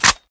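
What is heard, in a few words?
bolt release; tacz; daffas arsenal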